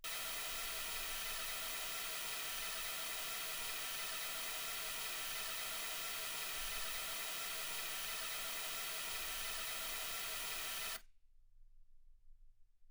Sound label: mls16 48kHz